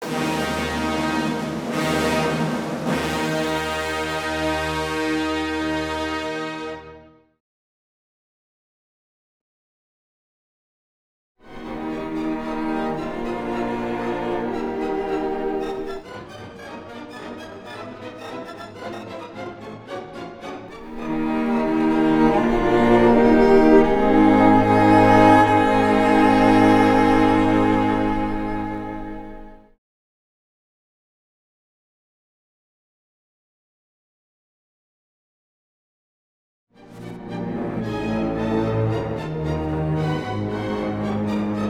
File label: fanfare